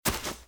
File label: grass; firefight